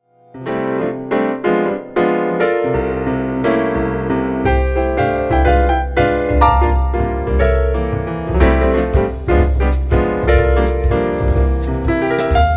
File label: Piano